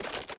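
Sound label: equip movement; valorant; araxys operator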